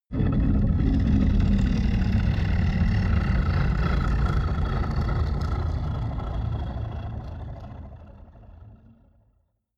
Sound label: crumble soundscape; horror